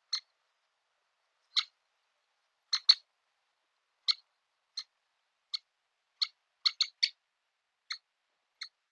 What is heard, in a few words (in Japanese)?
akaerihireashishigi